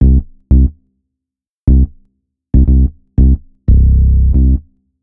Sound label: Amp Bass; rap